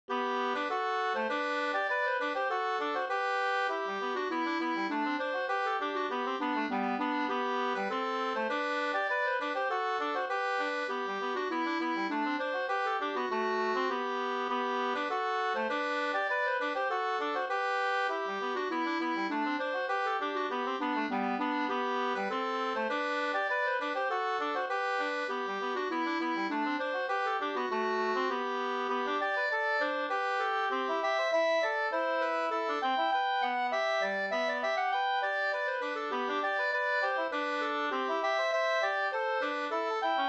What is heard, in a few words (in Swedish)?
slangpolska